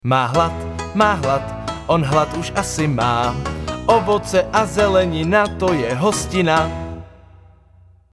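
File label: Male Czech sing mix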